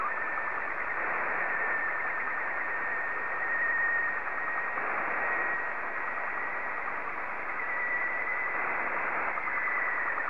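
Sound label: Robot 12 black and white, slow-scan television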